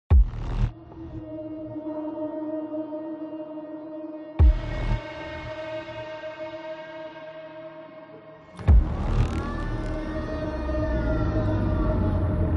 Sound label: Intense Hybrid Trailer